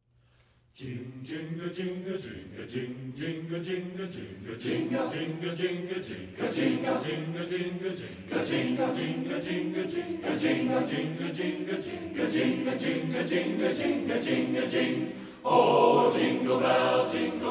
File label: jingle bell